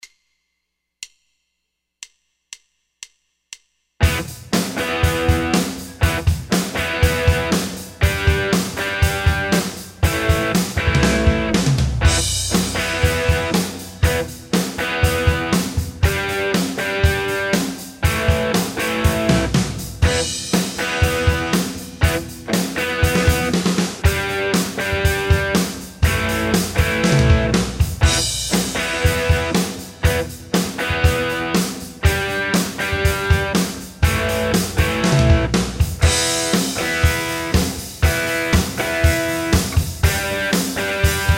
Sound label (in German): Bass Rock Session; Bass Einmaileins Deine erste Rock Session